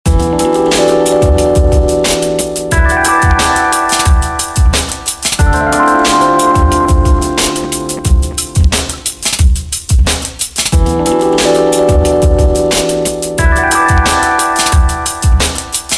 City Lights Hip Hop Ringtone

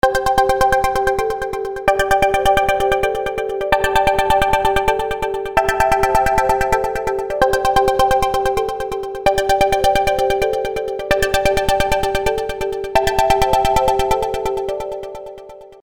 Waves Ringtone

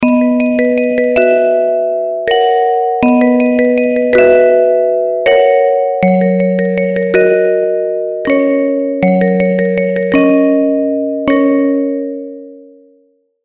Cute Melody

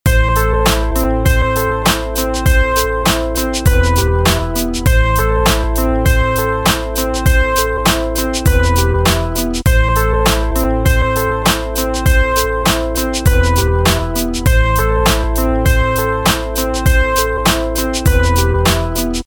Hip Hop Melody Ringtone

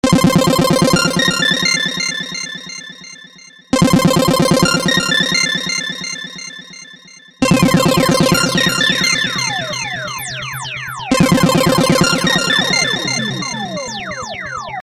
Acid Space Ringtone

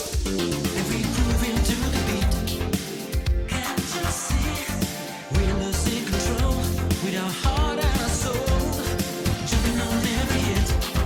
Synthwave Retrowave Synthpop RnB Electronic

